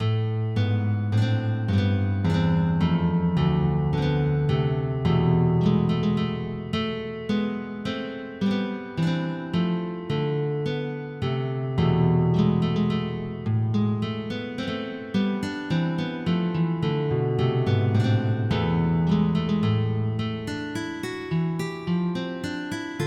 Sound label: Chaconne, MIDI